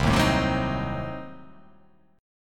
C Diminished 7th C

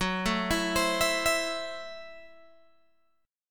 F sharp Minor 7th Flat 5th F sharp Guitar Open G

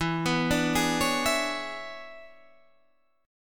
E 13th, Guitar, Half Step Down

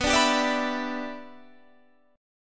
E Minor 7th B

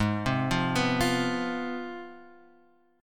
C Major 7th Suspended 4th Sharp 5th, guitar

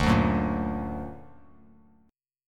D Augmented D down Bass Standard

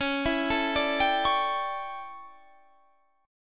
A 11th Db, guitar chord

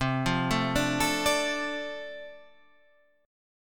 D-Minor 7th C, chord

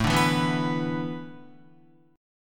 A Suspended 2nd Suspended 4th down Guitar; Asus2sus4 strummed

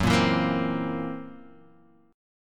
Gb 7th Flat 5th Gb down Guitar Half Step Down

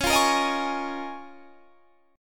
C sharp 6th Add 9th C sharp down Guitar Standard; strummed; C#6add9; JGuitar

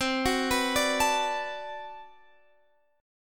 B-Minor 7th C

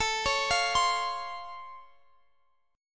Db Augmented Major 7th, A

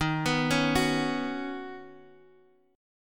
Eb Minor 7th Sharp 5th Eb Guitar Half Step Down

